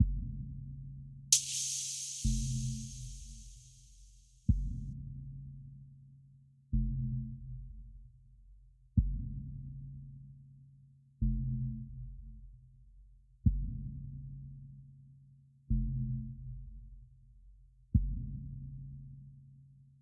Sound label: Tension Kick Curve; nova 111; ps vita